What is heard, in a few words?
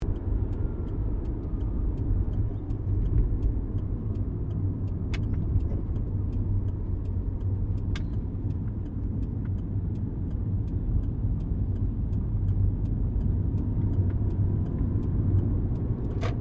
CAR SUBARU LIBERTY INTERIOR DRIVING TURNING